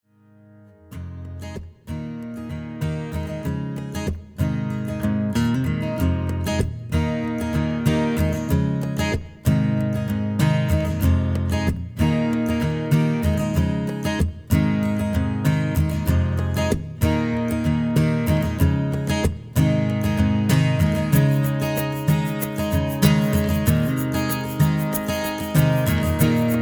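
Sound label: Cajon, A flat major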